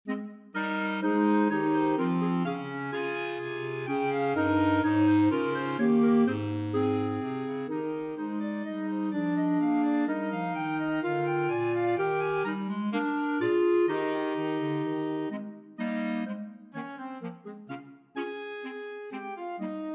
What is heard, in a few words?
bourree, clarinet quartet; handel